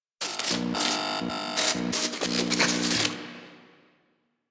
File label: Kill Screen Glitch Off; Warframe; Caliber Chicks minigame; transitions